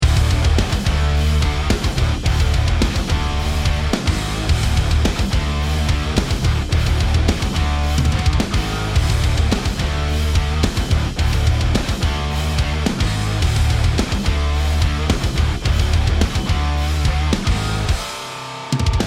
start round

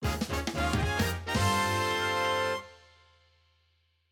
Survival Bowling - Round Result Win (Jingle); Nintendo Switch Sports

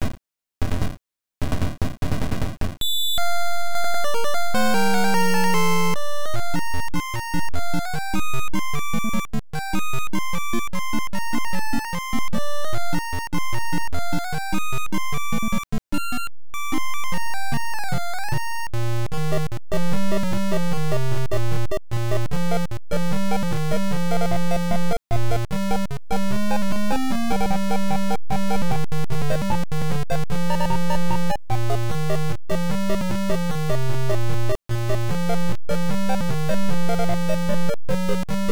Start Music BGM Intro BGM; Sky Kid; NES

